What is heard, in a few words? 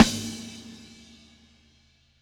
Green Snare